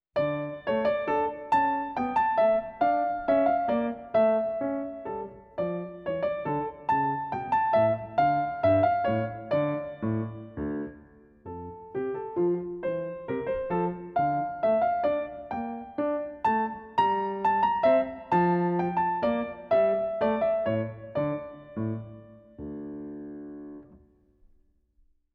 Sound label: Air in D Minor; ZT 676; Henry Purcell; Celebrate Theory recordings